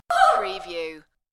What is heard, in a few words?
Wmn Fear